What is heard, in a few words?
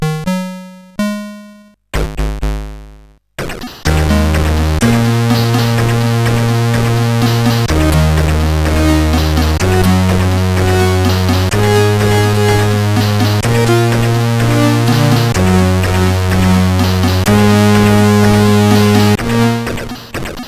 sid, CSG8580R5